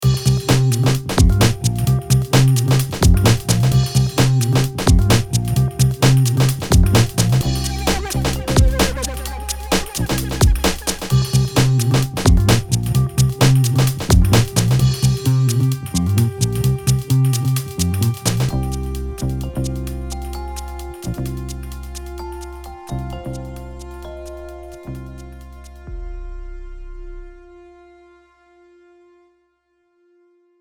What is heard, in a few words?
Funky Bed variation